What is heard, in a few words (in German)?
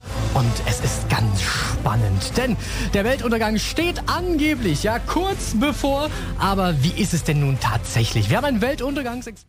Spannung